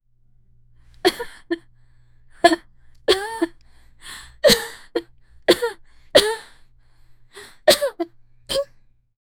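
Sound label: 15 year old female coughing; health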